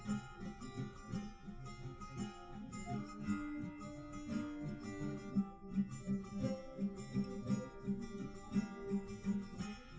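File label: no drums, live recording 250ms 5cm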